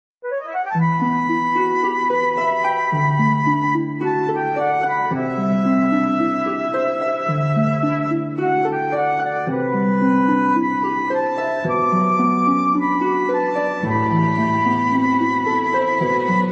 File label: bg music